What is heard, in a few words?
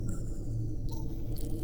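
Music Dpad Evaporate 145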